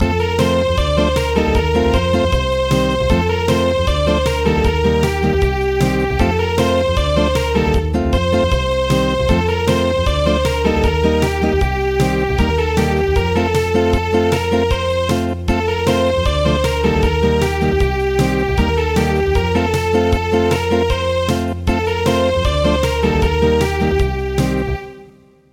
Acoustic; Lorig

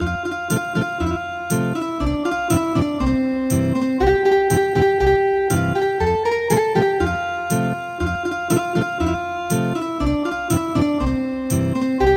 Ethnic Guitar; Chan Mali Chan

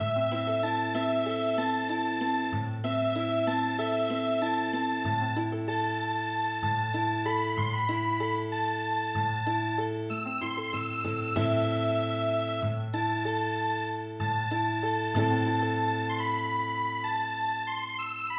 Healing Harp; Jindo Arirang

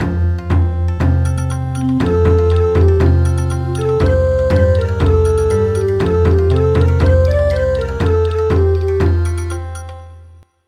Bon Odori; Names of Days

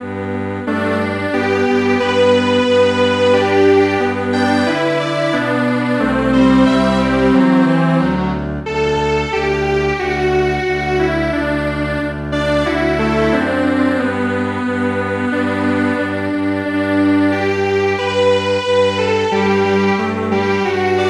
Strings Quartet

